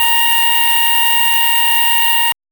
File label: high speed fpv drone